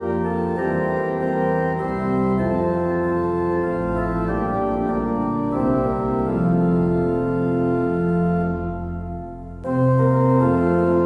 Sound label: Hymn organ; Away in a Manger; Cradle Song